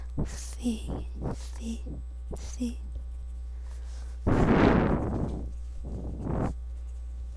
sea

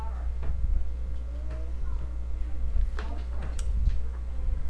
coin

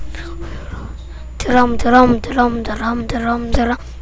drum

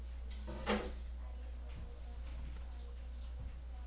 car

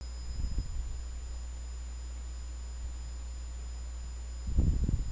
door

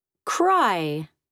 cry

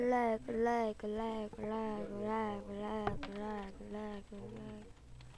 laugh

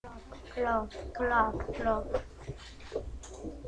clap

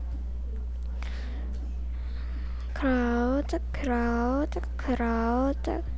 crowd

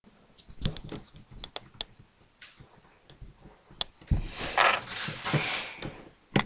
violin

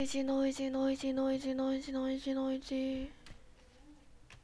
noisy

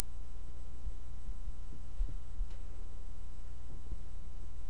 erupt

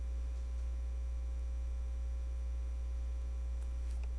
door

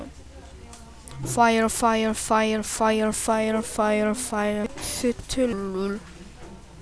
fire

